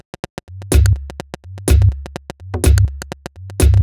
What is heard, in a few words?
Top C 125bpm, House Drumloops